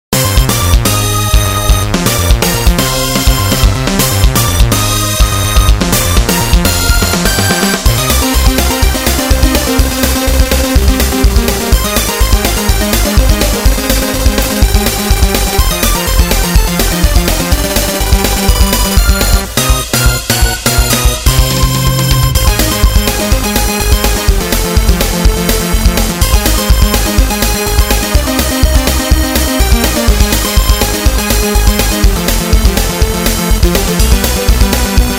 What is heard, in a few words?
bass; thunderbolt